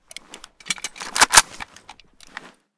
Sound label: unjam, weapons m4a1 ras; sr unjam; Boomsticks and Sharpsticks